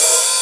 OHH; sound canvas

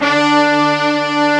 MIXBRS SEC D3, MIX.BRS; AKAI S-Series CD-ROM Sound Library VOL-2

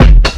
COMBI, Houseworx; Ueberschall; Workz-9

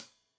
Hat Loose, Hi-Hat, Cymbals Stereo

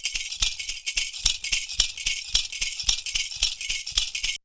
PERCU; Univers Sons; Raricussions

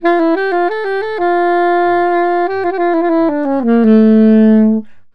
SAX, Balkanish Traditions

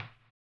HAMBONE, Vocal Blues Soul; Spectrasonics; Planet

